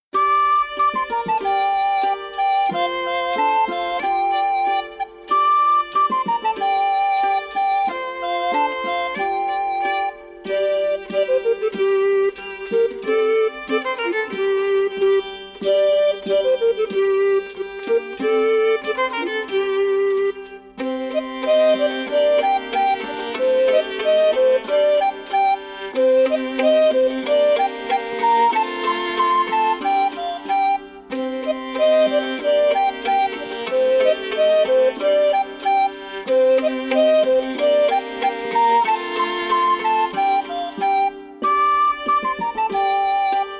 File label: medieval court music